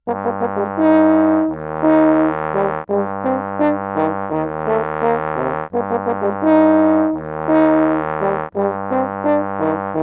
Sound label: 85 Eb maj all, Brass; 90 s Sample CDs; PowerFX - Downtown Orchestra